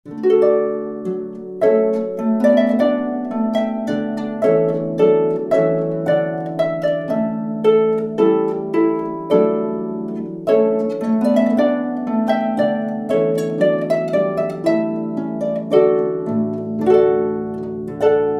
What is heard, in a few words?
Trumpet Voluntary, harp